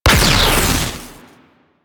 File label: Burst Laser Shot; Warframe; Teralyst; Ropalolyst; Abilities